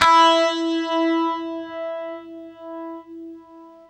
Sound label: UNIVIB E, Guitar; East West; Steve Stevens Guitar Samples Collection